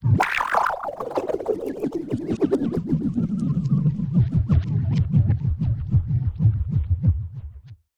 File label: space scientific accelerator